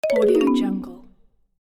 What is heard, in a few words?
marimba down